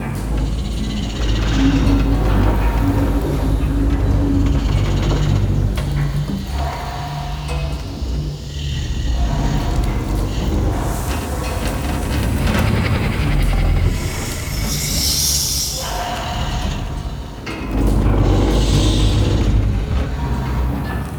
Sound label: Transition Room Loop; Ambience; Warframe; Entrati